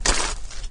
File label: walk, flood